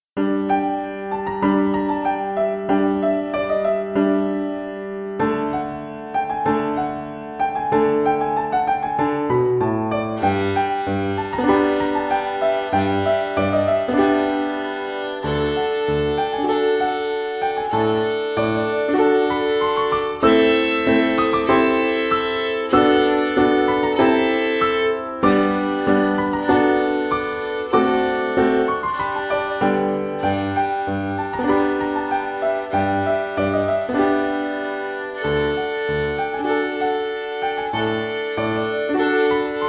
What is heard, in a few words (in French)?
Violon et Piano